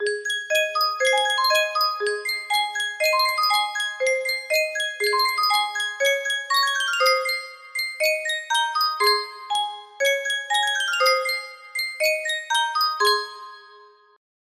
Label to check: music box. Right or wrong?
Right